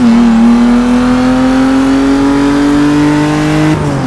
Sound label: third, vehicles; gmod; tdmcars; for taurus 13